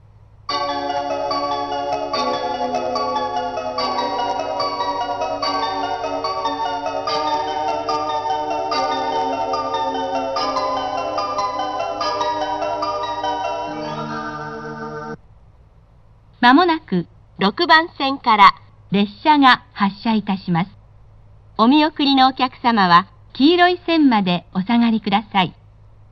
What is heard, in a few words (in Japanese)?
発車メロディー; ワンマン運転の時は鳴りません。; 山形駅; 鉄道